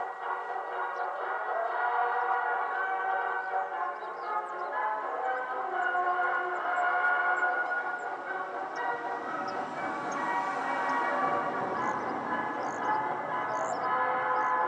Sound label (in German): Marsch am Mittag